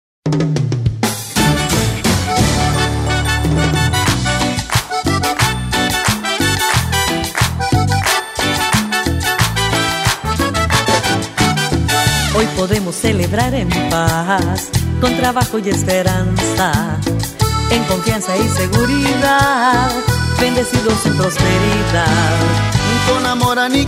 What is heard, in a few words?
CUMBIA